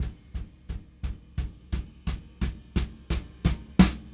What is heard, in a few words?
Brushes SN RC